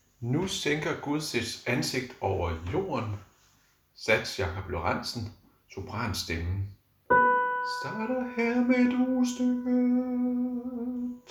sopran; harlev koret

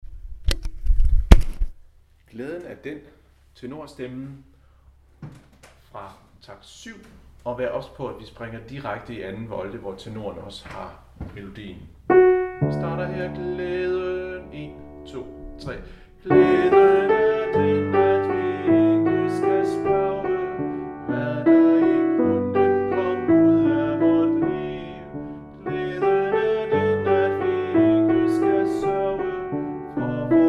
tenor, harlev koret; Glæden er den

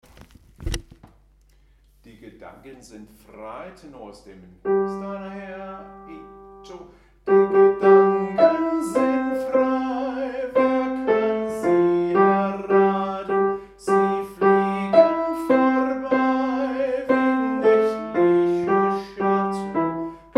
tenor; Die Gedanken

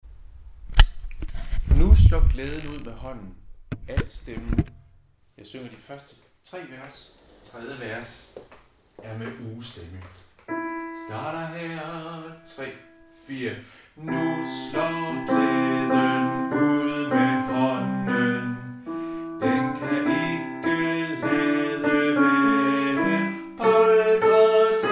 alt, harlev koret; Nu slår glæden ud med hånden

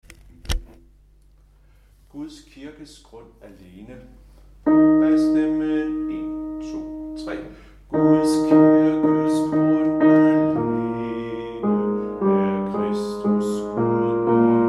bas, harlev koret; Guds kirkes grund alene

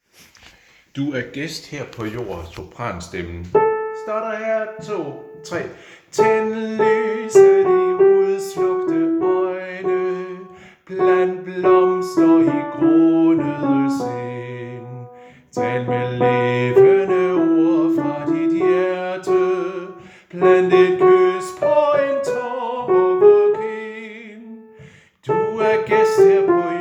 SOPRAN; harlev koret

